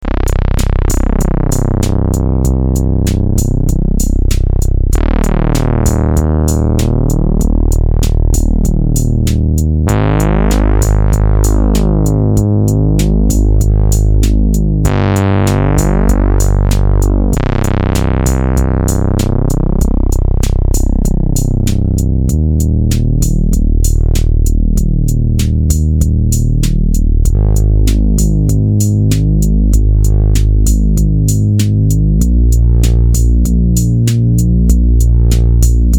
taurus preset

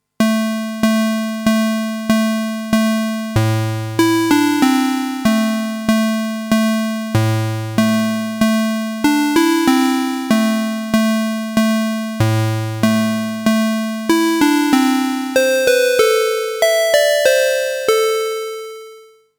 Juno 60 Puls

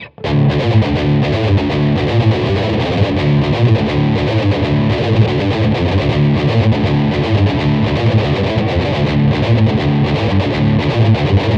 Downtuning

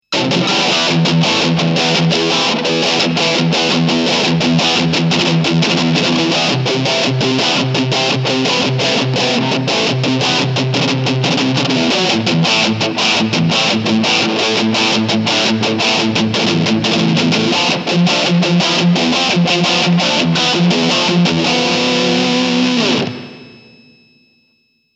Reverb

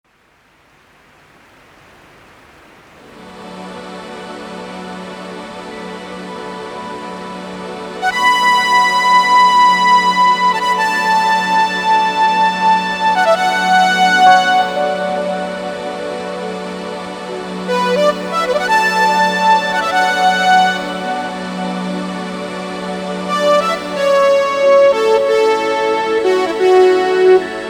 Lexicon PCM70; Rainy Day in Friedenau

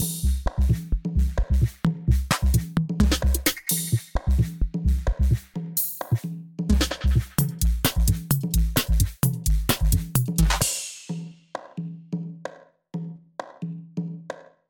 Bloc beat

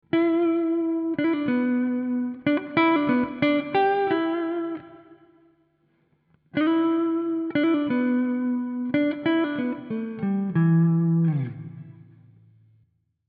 Guitar Lick Bright Plate

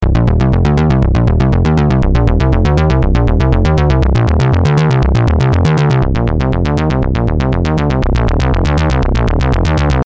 alt waves

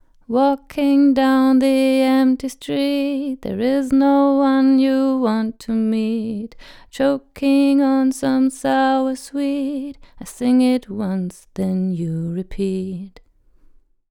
Rode NT1 Vocal RME FF800

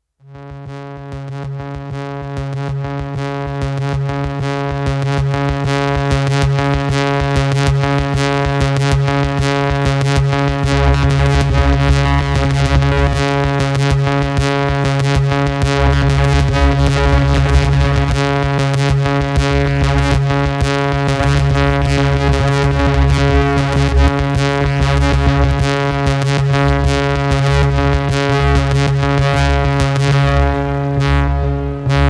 Cooper FX Outward V2

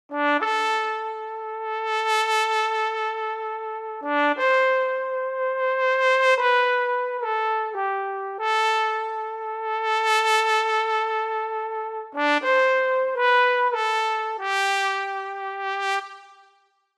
Logic ProX Studio Trombones

